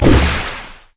syndra w launch; LoL

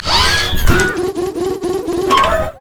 emote laugh; LoL; blitzcrank

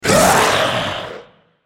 Dragon spell cast effort; Shyvana

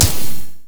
frost; LoL; anivia

